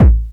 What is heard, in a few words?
TECHNO BASE; Q Up Arts; Dream Experience